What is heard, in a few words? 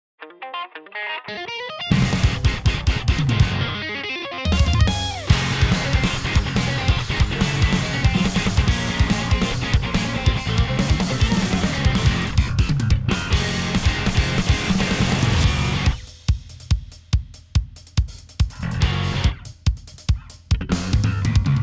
Vo MinusOne; NCS オーディション楽曲